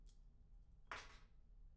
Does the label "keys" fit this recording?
Yes